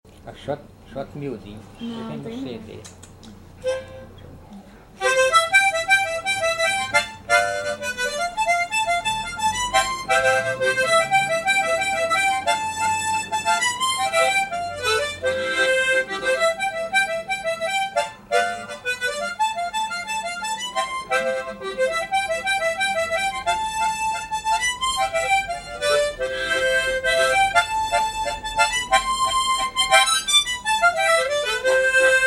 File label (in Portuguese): gaita xote miudinho